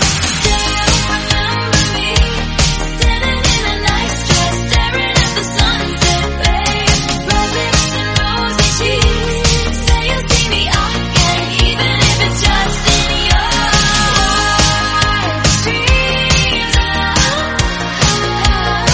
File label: Slam End 140bpm Ab; remixplanet